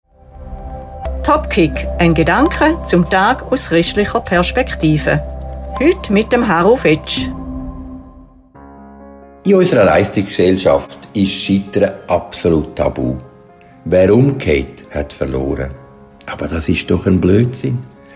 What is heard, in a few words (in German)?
Applaus Crash